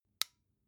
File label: flashlight push in